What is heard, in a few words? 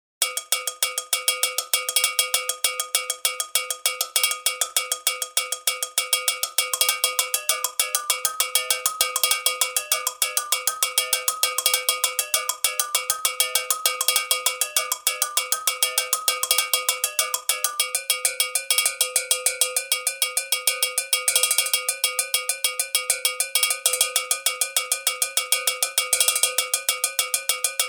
World Percussion